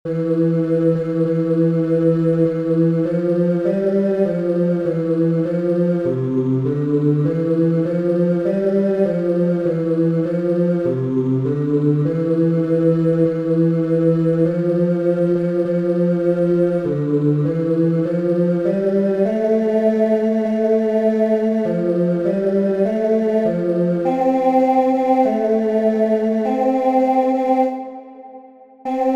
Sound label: Fanfare